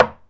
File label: Golfball Land Wood; Misc Sports